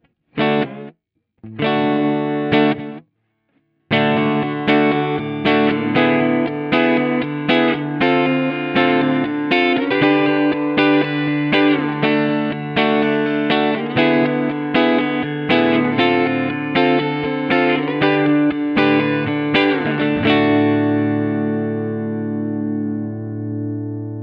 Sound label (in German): Keeley Realizer Reverb Reverse Mittelstellung